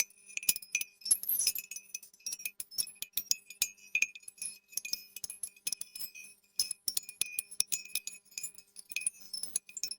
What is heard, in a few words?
Christmas Ornaments Clinking